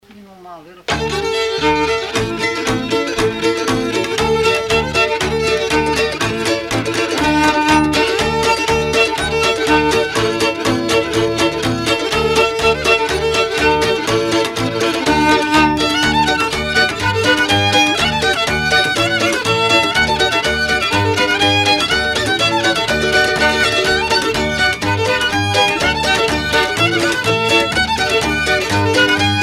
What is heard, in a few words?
HORNPIPE; MARMADUKEs